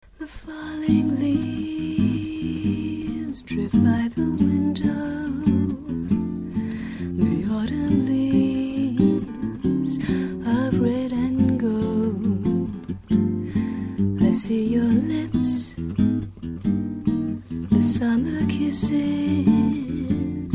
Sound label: chamber jazz quintet